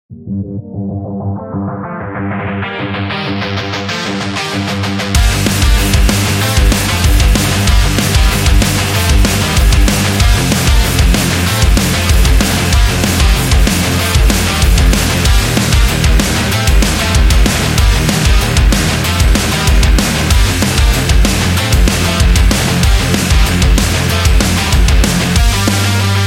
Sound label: The Heavy Metals